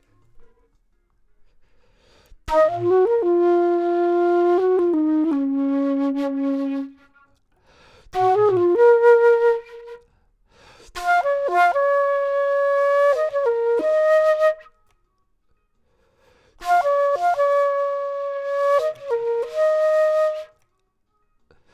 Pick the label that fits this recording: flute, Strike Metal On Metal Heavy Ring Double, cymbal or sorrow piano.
flute